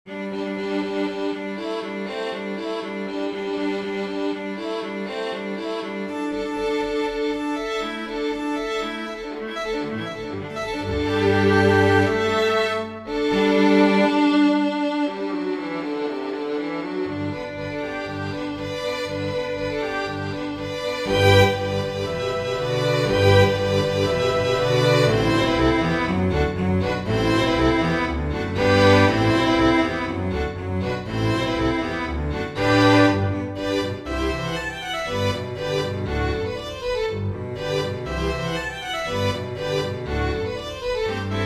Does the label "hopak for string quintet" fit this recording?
Yes